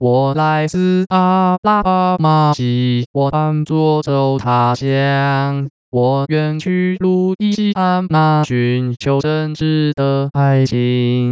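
Synthetic singing voice (male), No coartcltn, Chinese; Mandarin Singing Voice; O! Susanna; (噢! 蘇珊娜); Pitch Coarticulation for Mandarin Singing Voice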